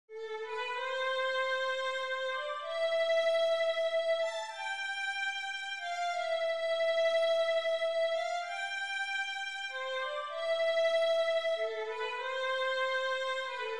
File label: barcarolla violin